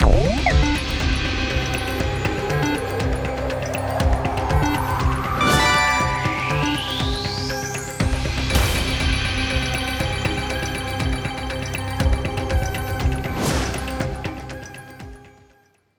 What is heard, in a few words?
superwin horror; bigwin; Demon Jack 27; Wazdan